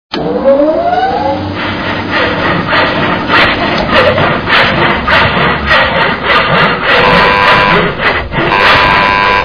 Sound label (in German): saege